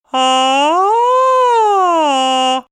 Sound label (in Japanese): 地声と裏声をつなげる（成功例）